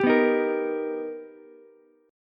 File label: B Augmented Major 7th B Ukulele, chord; B+M7; strummed; JGuitar